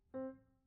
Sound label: Piano B; Piano Workshop Rec